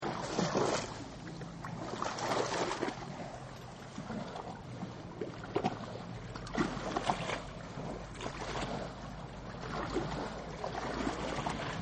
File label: Water Lapping Sea Wall; Water Cruising Longboat; Boats; Ocean; Nature